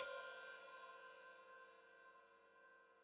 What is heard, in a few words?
Hat Open, Hi-Hat; Deeply Sampled Drums; Cymbals Stereo